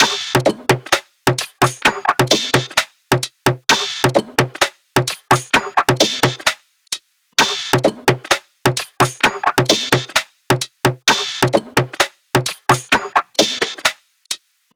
Power Perc Loop, 130 BPM; Cymatics; King Drum Loops

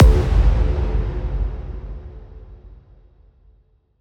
Impact, Hits, Impacts

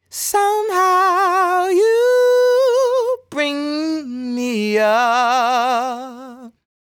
Sung Phrase Dry, 80 BPM G# Min, vocal; Bring Me Up; Cymatics; Euphoria Vocal Sample Pack

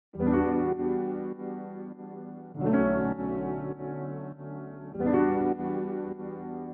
100 BPM E Min Piano; Loop Stems; Cymatics; Infinity Melody Collection; Betrayals

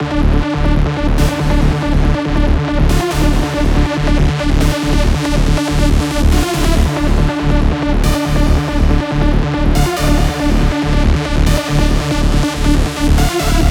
Full Mix Dm 140BPM, Techno; Rave; Melody Kits; GhostHack; Rave Melody Kits 2025; Kit Synthetic

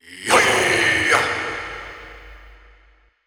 Processed Battle Shouts, Viking Vocal

